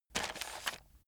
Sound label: chocolates take